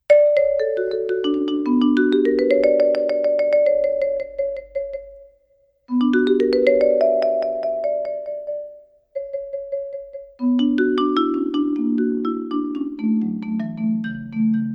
Marimba Coda

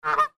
goose squawk

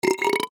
Burp

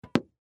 tennis

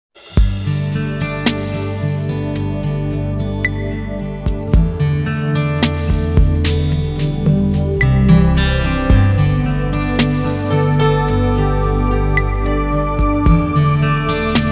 Simple Pleasures; Soundzabound Volume 4

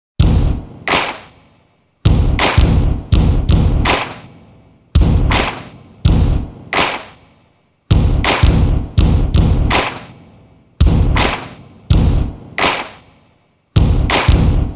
Stadium Stomp Clap; Soundzabound Volume 5

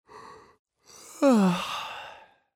Yawn

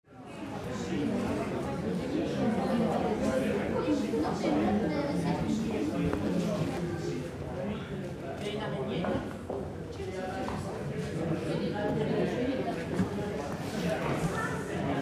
Crowd

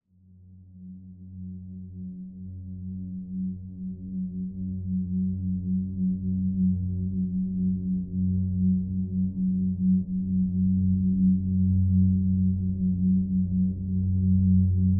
Drone Warble

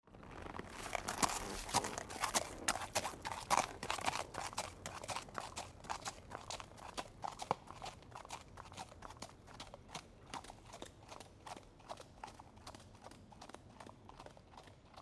horse gallop gravel

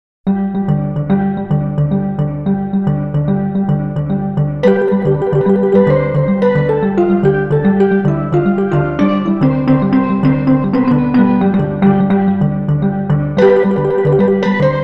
Steel Drum Ensemble, Caribbean; Soundzabound Volume 2 Disc 3

